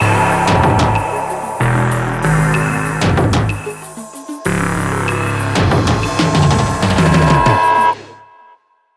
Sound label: Player death; Hyper 5; ps4; xbox series xs